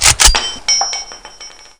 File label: m203 reload